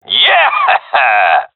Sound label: cheer, seal diver; Source Model Packs; Counter Strike Model Pack; CS2